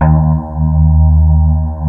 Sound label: STRIA, Twisted Textures; STRYNGI